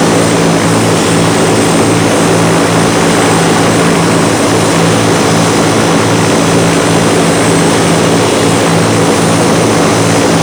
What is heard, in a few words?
helicopter engine close; Rust